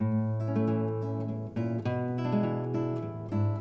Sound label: song; redden